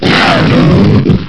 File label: zombie death; blackhawk